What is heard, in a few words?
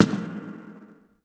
step echo; people; Pirates Of The Caribbean